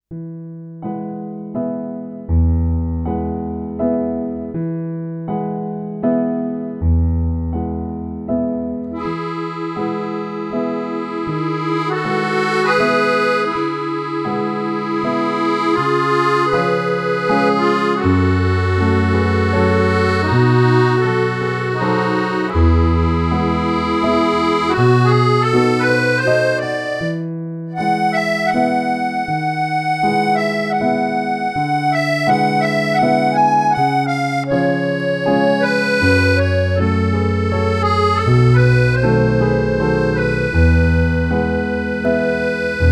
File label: accordion